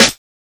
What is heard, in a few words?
clicky steel snare drum sound a sharp key